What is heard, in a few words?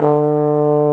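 BRS Bass Trombone, Solo; Roland LCDP06 Brass Sections; Bs.Trombones; Bs.Bone Solo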